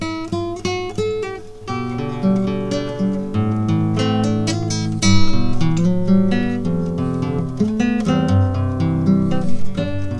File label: guitar; mda